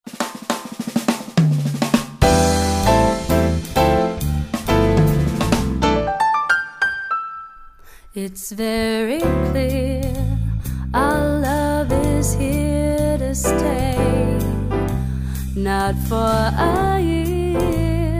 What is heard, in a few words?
jazz sample